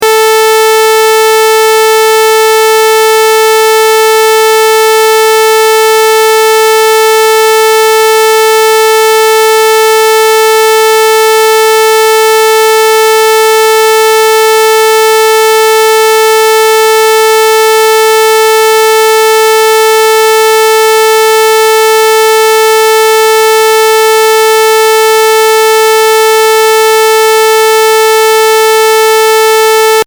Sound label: Sawtooth